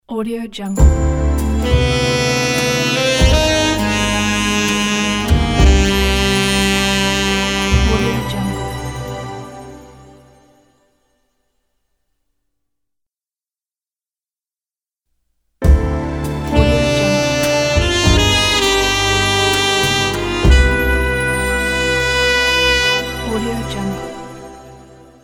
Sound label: jazz sax logo; mr b music studio